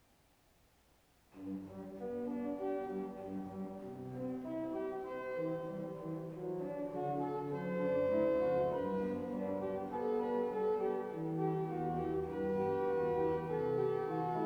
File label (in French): improvisation musicale